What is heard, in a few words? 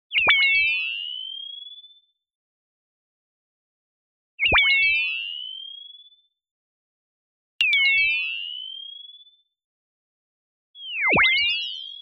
tonal, accent bird chirp long; boom library; Anime Essentials